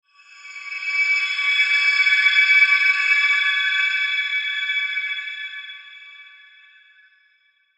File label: Shimmer Filter Crystal, Shimmer Bells Chopper Flange; High Tone; Blastwave